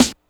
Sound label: snare, Hardcore Breakbeat; Big Fish Audio; Hardcore Breakbeat Action